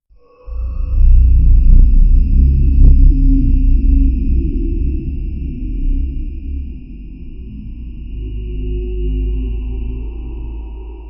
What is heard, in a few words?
low sinister growl mixed; sly